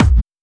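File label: drum Loop 140BPM bass drum Short; Vengeance - Essential Clubsounds Vol.5; VEC5 Drumloops; VEC5 Multi Loops 140BPM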